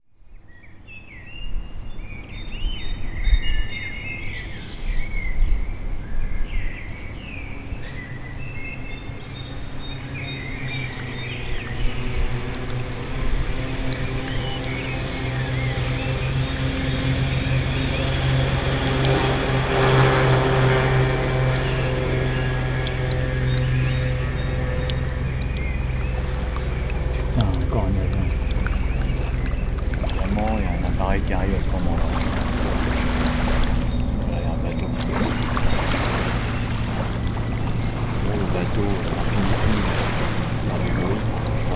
Bruit

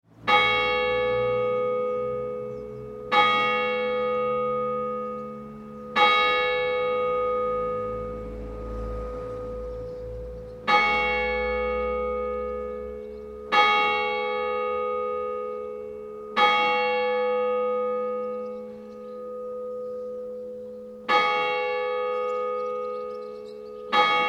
cloches; veyziat

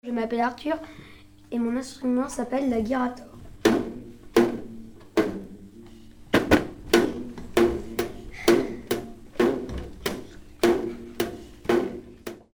guirata

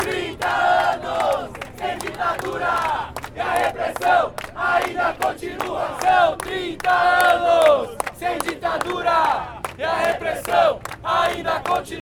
slogan manif

